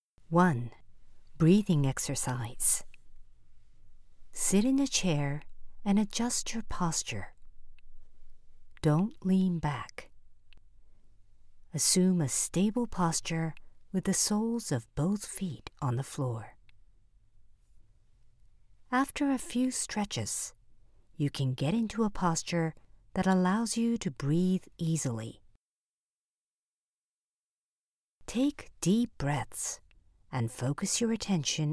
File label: breathing exercise